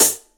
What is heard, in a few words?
tamed hi hat d key; drum single hits one shots; hat samples